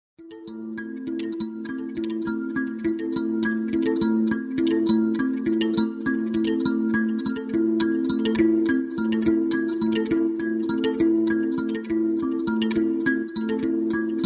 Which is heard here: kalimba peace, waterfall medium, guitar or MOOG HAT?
kalimba peace